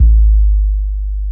SUB BASS A2; Explosive Jungle; USB Soundscan vol.05; AKAI

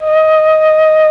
FLUT; ILIO; Propeller Island Legendary M400

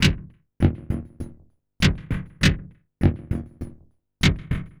perc turgid, Percussive Loops, 100 bpm; Sample Magic - Transmission-X

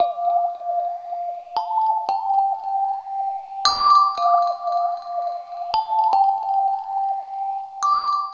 Otherworld Chime; Sonic Foundry (Sony Creative Software); Loop Noir; Session 12 - Otherworld Journey

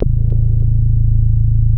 WALL MOOG, Synthy Tonal; Distored Reality

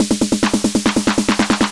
140 trance break; Trance Explosion Vol1

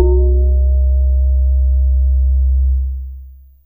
Bar C2, Fairlight CMI IIe, Mallets

